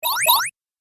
Arcade Game Double Laser Zip; Video Game Sound Effects; Retro Inspired Sound Effects - Think Nintendo, Atari, C64, Etc.

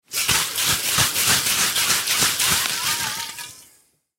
Aerosol Shake Can, Aerosol Shake Can Liquid; foley; Shaking Sound Effects; Blastwave

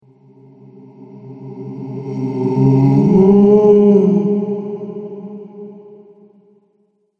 Shadow Spirit, ghost; horror

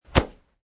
Slap Noise; Clothes Slapped noise

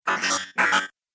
Robot Talk Droid; Robot Sound Effects

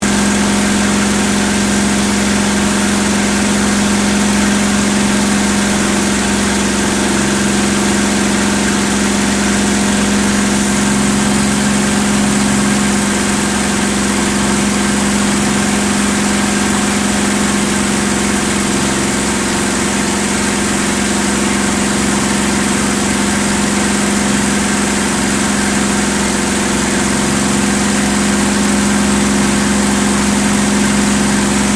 AIRCRAFT CAC CA-18 MUSTANG MK23 FLYING INTERIOR; CA-18 /P-51 Mustang; Airplanes; Vehicles; Aircraft Collection; Twistedtracks

